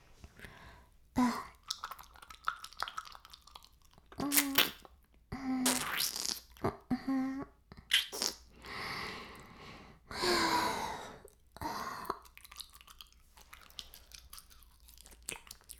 耳舐め音声(LR逆); 白くて黒い魔性円環

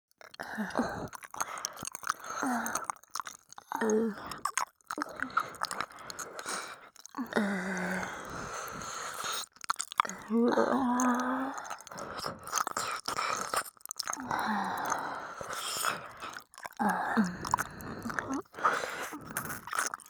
両耳舐め

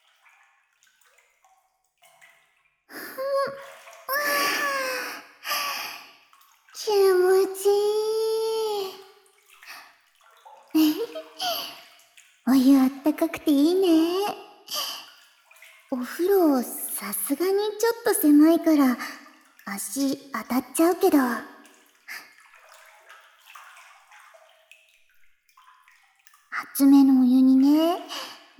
湯船でゆったりまったり, ASMR

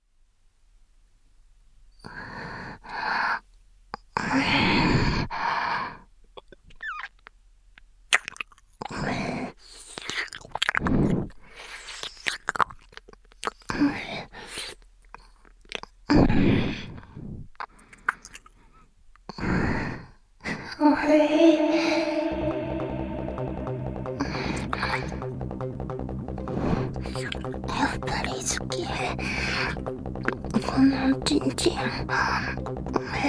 快楽まみれの耳舐め＆フェラチオ; 共食い; 電脳Extacy

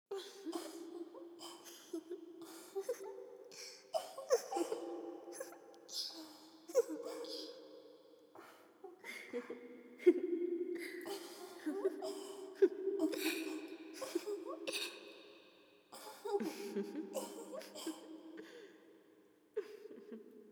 女の子たちの嘲笑 パターン A; リディクル・エクスタシー催眠～女の子たちに嘲笑される快感～

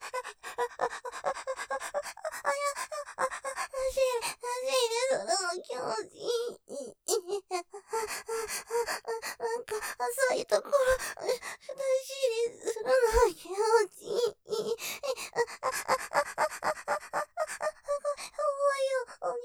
絶頂寸前 浅いところを出し入れするの気持ちいい ピストン早い; ステレオ

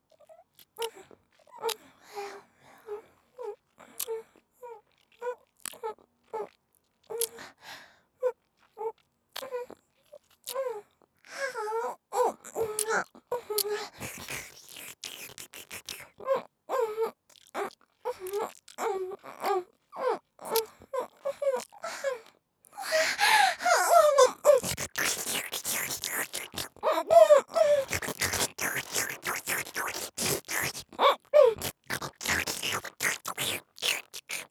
フェラ; とらこ; 現代版とらこさん

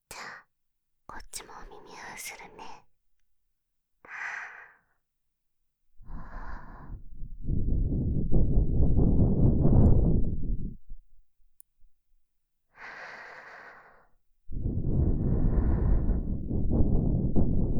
吐息（左耳）

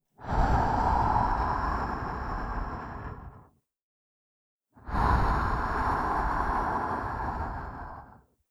吐息

